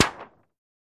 Target Hit Light Pap, target range

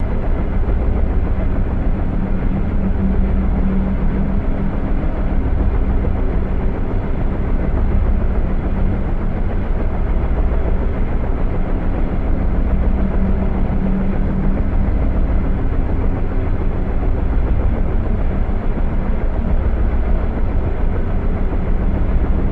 Fan, fans big; Fan Martain R6

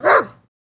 Dog Bark Yelp Lower, golden setter

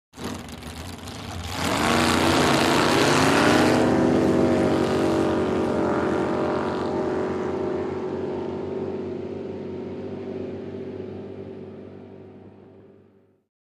Drag Boats By Race

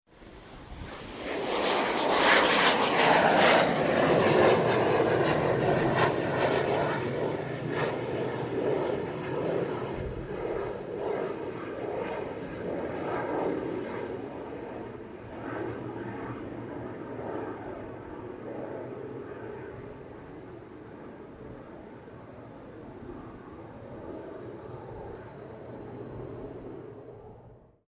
Jet Take Off Lng Fade, jets; Jet take off, long fade

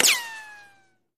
Bullet Ricochet Sin

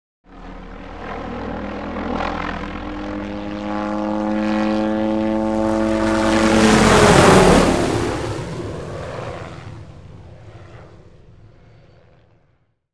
Hughes 500 Single By, hughes 500

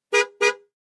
Aston Montego Horn Bl; aston montego ext. horns

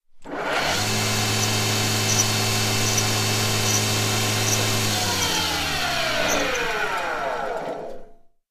Carpentry Machine Idl; construction small

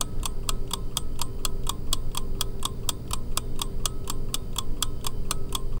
Egg Timer Clicks Loo

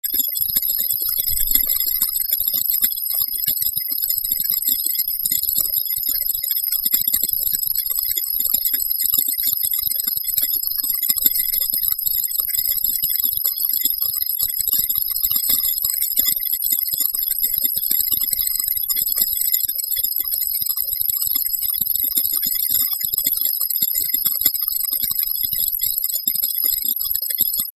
Synth Data Processing

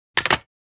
Phone Receiver Hang up

